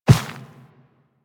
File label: Walk Dirt, Footsteps; Warframe; Operator